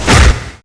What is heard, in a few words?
bullet hit melee; projectiles; Max Payne